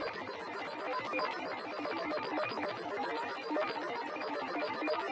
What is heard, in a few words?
Alien Computer Loop; Mo Pink; Doodle Jump 2; android